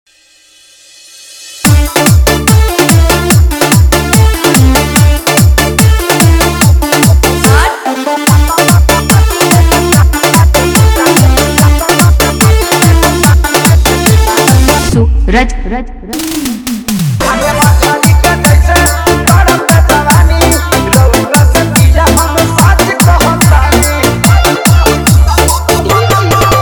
Bhojpuri Dj Remix Song Hard Electro Bass Mixx; prayagraj

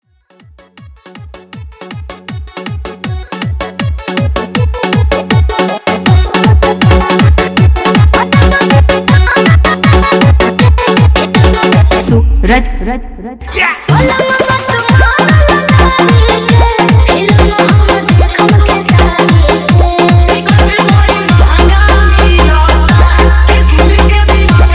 New Trending Viral Bhojpuri Dj Remix Song

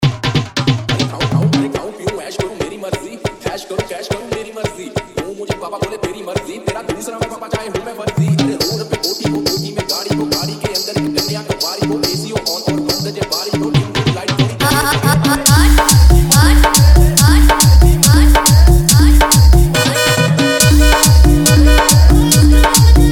Hindi Dj Remix Song Hard Dholki

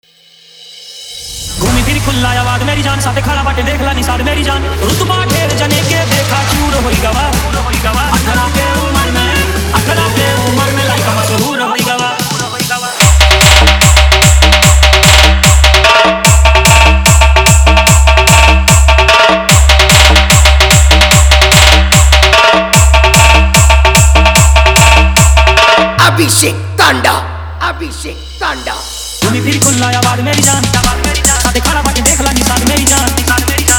New Trending Viral Bhojpuri

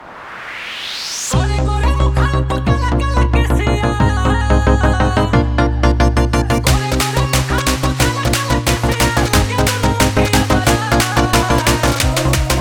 New Trending Tadka Bhojpuri

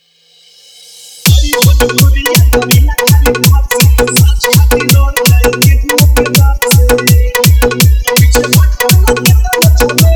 New Trending Bhojpuri Dj Remix Song

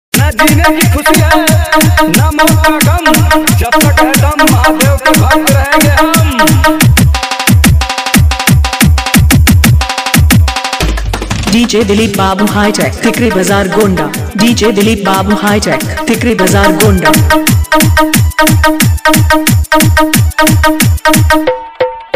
Mixing Hard Toing Bass

